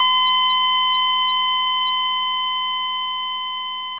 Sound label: flatline; source engine; bshift; fvox